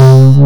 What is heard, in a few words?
DD SYN, Disco House